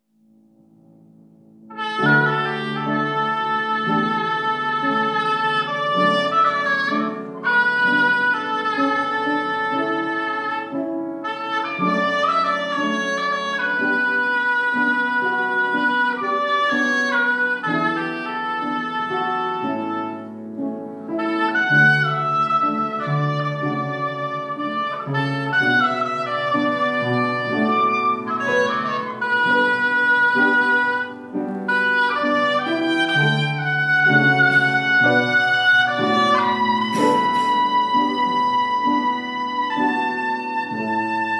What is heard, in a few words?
oboe